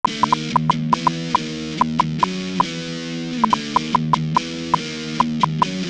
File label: dist guit